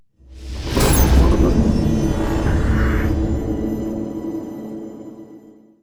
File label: archeology event finding loot; ancient relics